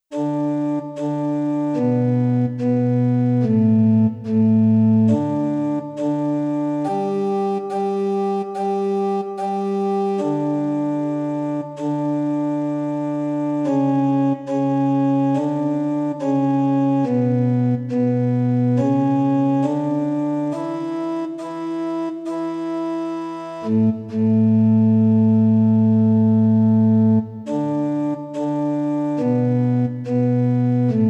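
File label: Pitch 440 Temperament MeanTone, organ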